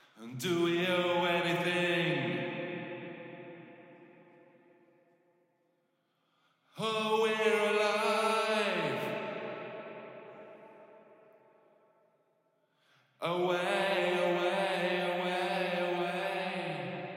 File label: vocals back